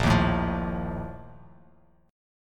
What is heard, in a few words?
A sharp Major 7th Suspended 4th, Bass Standard; strummed